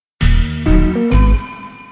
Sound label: ding ding